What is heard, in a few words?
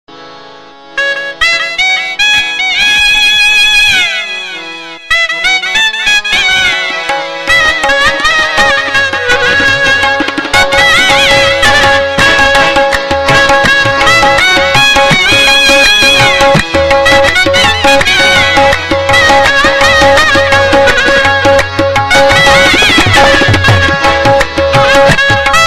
Bansuri